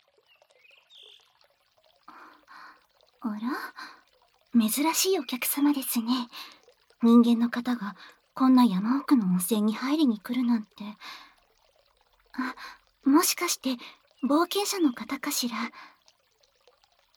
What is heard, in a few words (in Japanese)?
耳舐め, ASMR; 異世界転生！エルフお姉さんにされる手コキ耳舐め♪(耳舐め、手コキ)